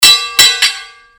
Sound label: Sword Sound; FXHome; Fighting Sounds